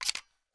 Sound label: m3s90 load